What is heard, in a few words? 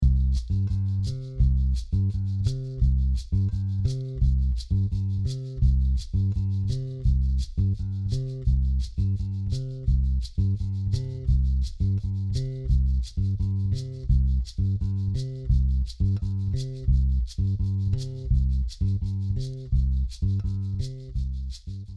Habanera